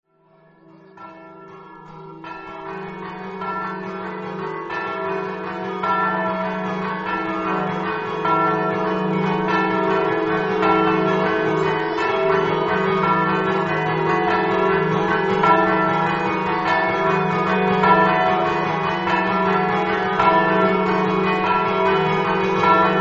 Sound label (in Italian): cividale, campane